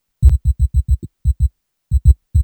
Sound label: 205bpm; elektron machinedrum